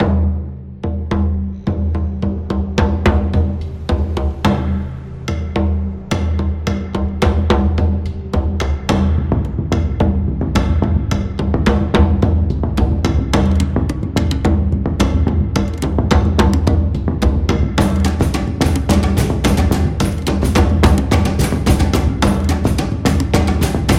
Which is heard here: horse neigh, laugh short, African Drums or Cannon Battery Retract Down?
African Drums